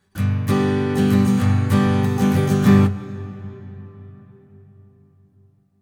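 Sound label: Tascam Model 24 A Git Schoeps Mk4 Gain 3 Uhr FX Large Hall, acoustic guitar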